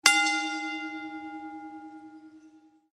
bell single; wwe

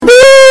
ooooooooooo

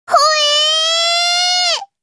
hooeee

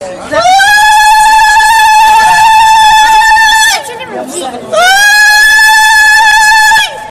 ululation

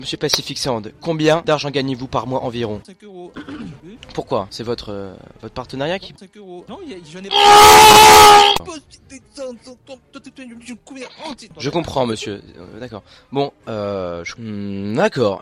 aaaaa

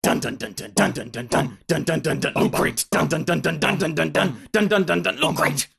dundundun

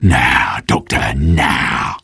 sniper charge

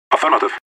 affirmative

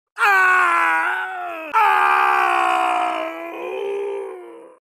extreme boost impaled scream; mk9 johnny cage

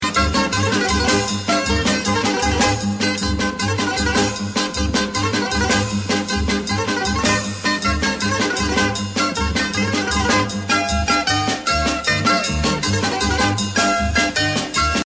sirtaki music; zorba the greek

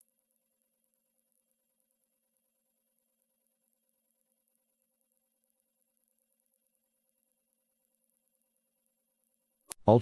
dog whistle

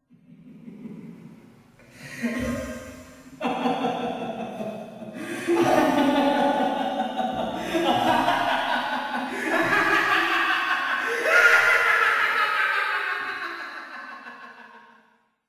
evil laugh

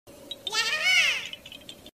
yahaha

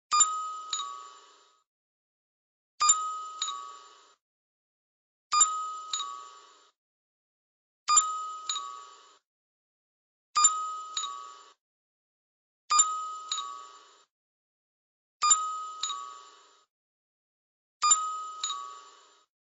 speed chime half speed; ae86

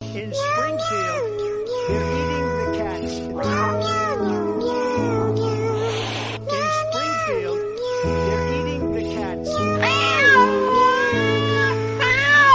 sad meow; theyre eating the cats trump

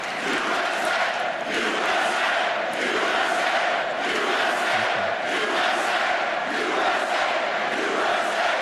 usa chant

